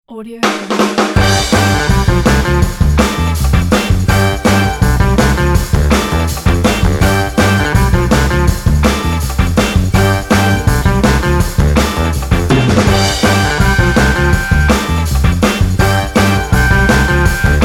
happy energetic old school funk

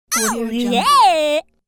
curious comic fool boy shout

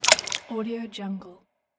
drop water falling

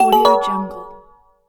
right answer

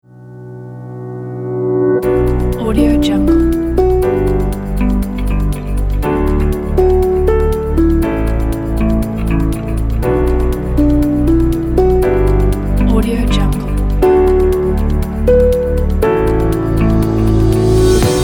ambient

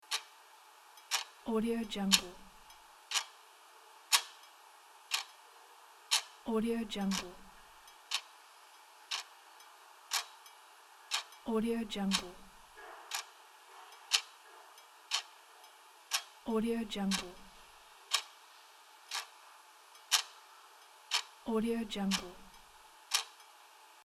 clock; lfo-sound

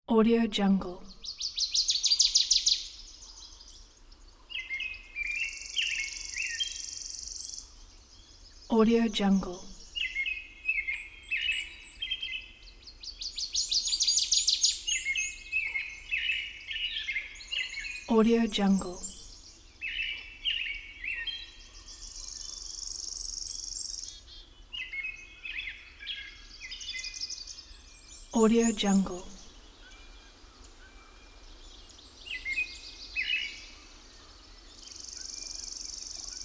forest morning

